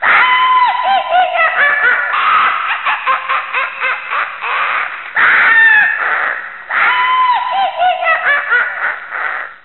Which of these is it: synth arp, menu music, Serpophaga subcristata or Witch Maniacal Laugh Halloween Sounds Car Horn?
Witch Maniacal Laugh Halloween Sounds Car Horn